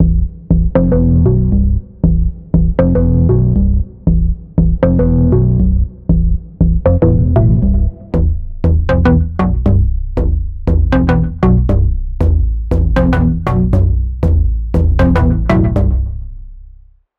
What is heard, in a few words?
Dark Organ, bass synth; Wave Alchemy - Bassynth 1.1.0